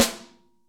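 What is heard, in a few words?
snare ambient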